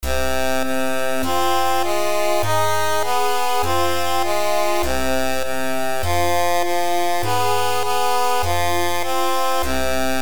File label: game over